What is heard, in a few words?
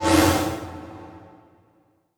Multimedia Internet CD-Rom Flash Pop Up